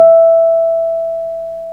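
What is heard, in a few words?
Wurlitzer electric piano E2; Keyboards of The 60's and 70's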